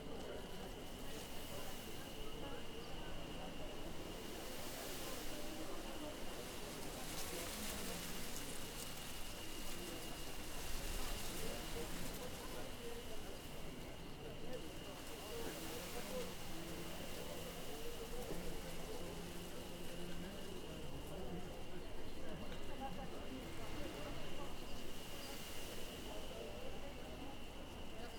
outdoor schoolyard

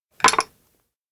cookoff fried rice plate; chiharu; anemoi

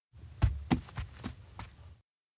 steps tatami walk; tsumire; anemoi

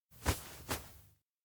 tap head light; rikka; anemoi

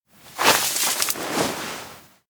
turn on noise; anemoi

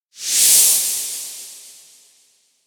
vanish; rikka; anemoi

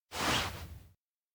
thumbs up; anemoi

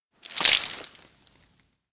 smoothie spill head; anemoi

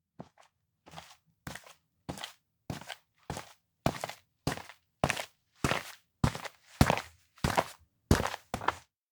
steps outdoor observatory walk; anemoi

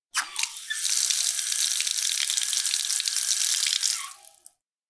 drink water tap; anemoi

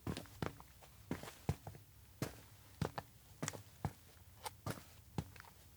steps outdoor town walk loop; spica; anemoi

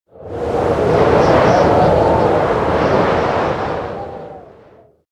wind strong; anemoi

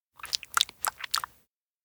dog lick face; anemoi

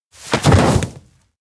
peek cockpit; anemoi

